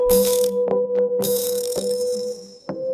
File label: notification